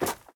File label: snow run, step; blocks; Minecraft; Remastered